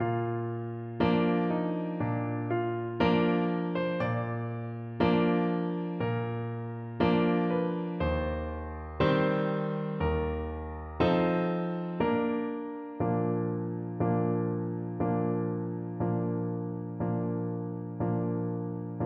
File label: battle hymn, Tuba